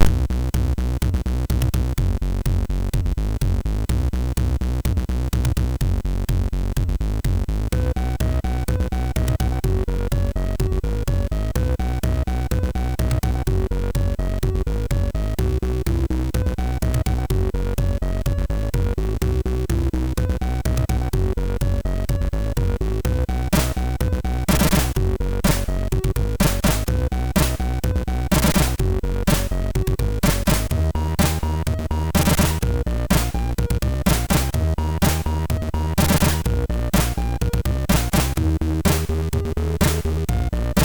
A Quick Tune, MOS6581; MOS6581R3; hvsc

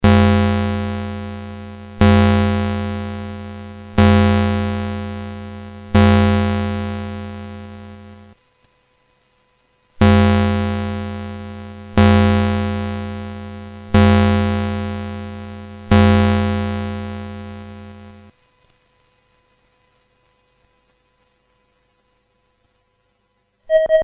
Ding Dong Bells BASIC, sid CSG8580R5

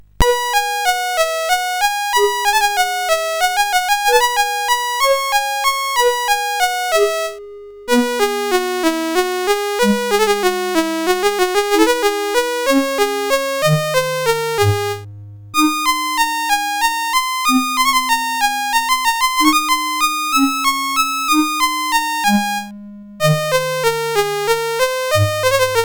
CSG8580R5, GAMES; WitchSwitch

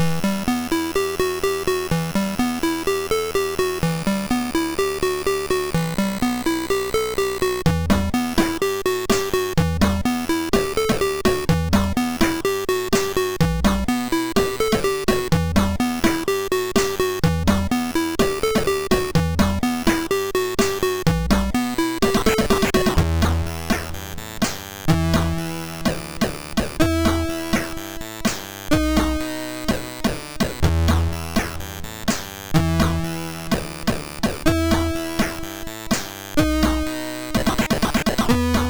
MOS6581; MOS6581R3

